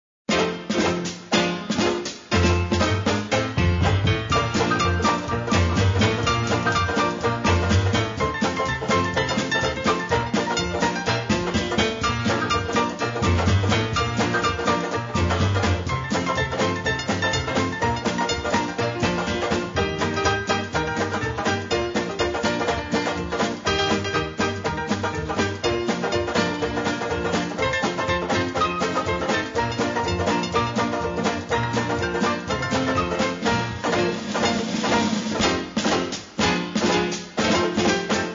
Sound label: Honky Tonky